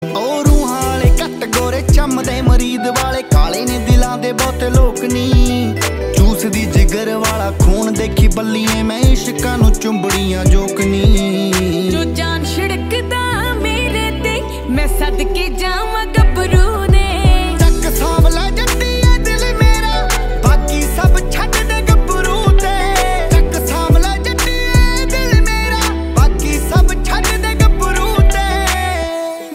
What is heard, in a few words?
Song, ringtone